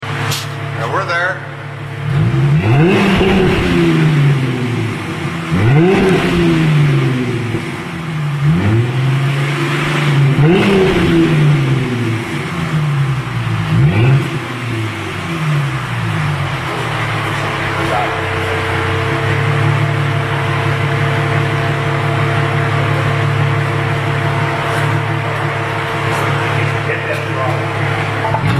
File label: Mustang 4.0L Magnaflows, exhaust sounds